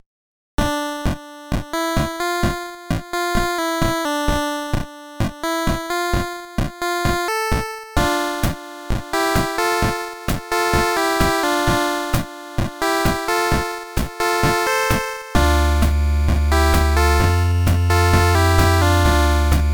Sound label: trance ish nes vrc7; weeklybeats 2024